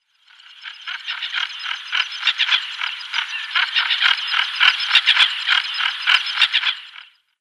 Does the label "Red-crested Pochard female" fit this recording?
Yes